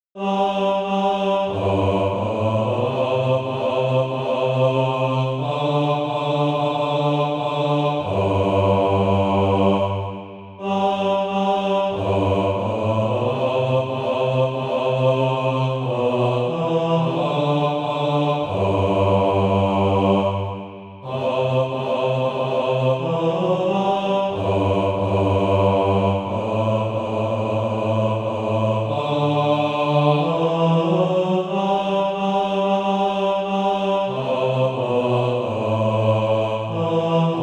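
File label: bass, SATB Practise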